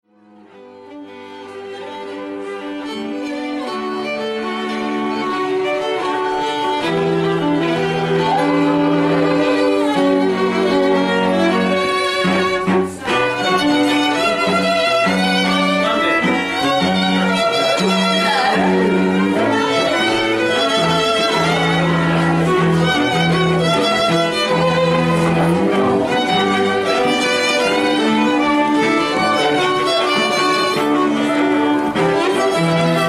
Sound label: strings